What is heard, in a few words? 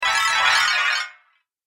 Fast Crazy Spell; Magic Sound Effects; Role Playing Game; Andrew Fleming